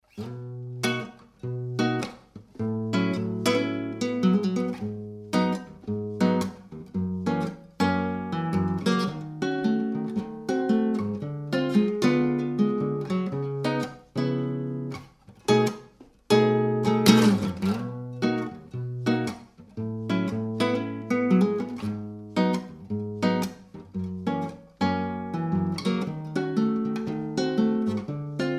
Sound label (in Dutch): jazz stukje